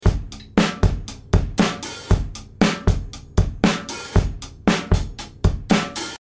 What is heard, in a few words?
Drums COMP3A Jr TIME A Red 10 full Gain 5 5 HPF